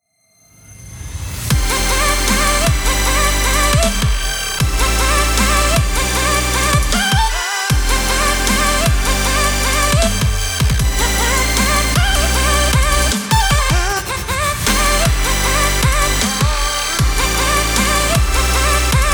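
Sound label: Mix Master FX EQ On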